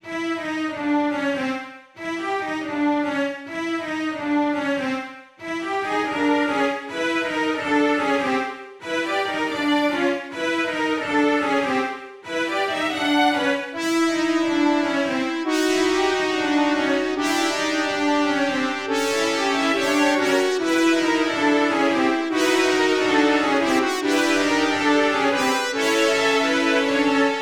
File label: strings